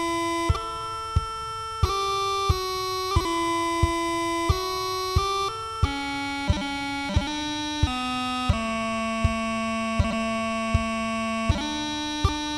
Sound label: Transition - Blue Bells - Loch Lomond, drumming; highlanders